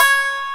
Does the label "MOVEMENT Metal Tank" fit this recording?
No